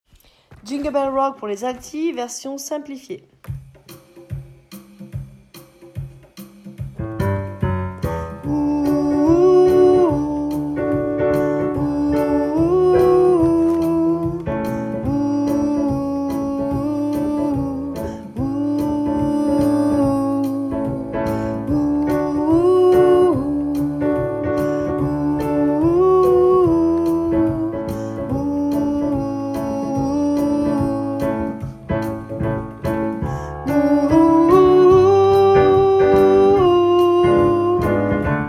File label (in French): simple alti; pupitre alti; enregistrements de travail